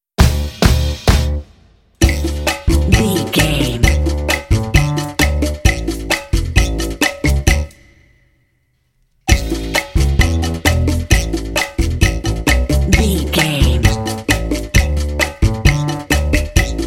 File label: cha cha cha